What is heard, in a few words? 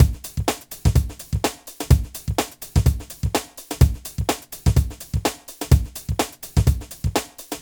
126 Pop Beat; Digidesign; Pro Tools; Pro Tools Essential Loops; Pop Rock; Drums